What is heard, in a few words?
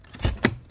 Electro FX; Ueb HE Electro FX